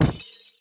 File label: Daily Gift Collision